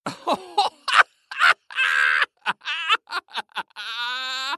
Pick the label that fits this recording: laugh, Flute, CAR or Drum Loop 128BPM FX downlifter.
laugh